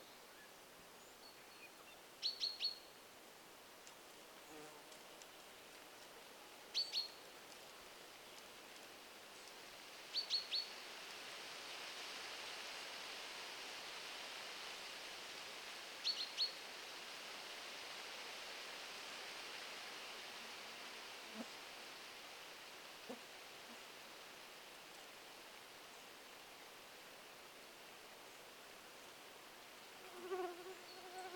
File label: Mosquitoes